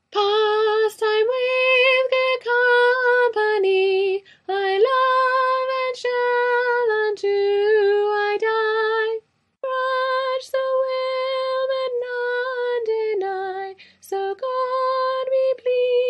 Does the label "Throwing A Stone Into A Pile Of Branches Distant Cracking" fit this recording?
No